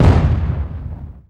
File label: tank gun light; 1944 Battle Of The Bulge